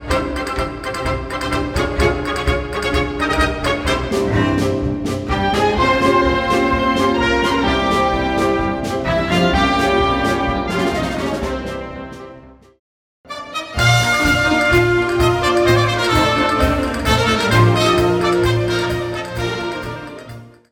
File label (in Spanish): Paso doble